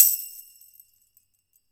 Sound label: DEFI OPEN, Mediteranean Traditions percs